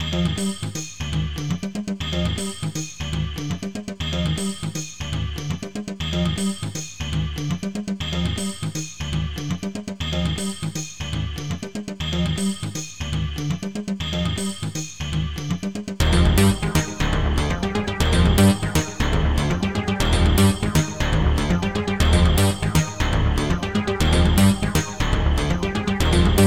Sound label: Retro Crime Movie Loop